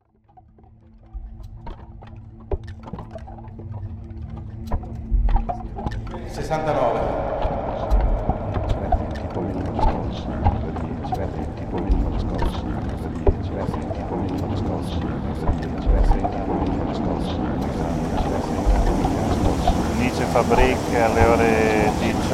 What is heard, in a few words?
Bam Boom; installations; hanging garden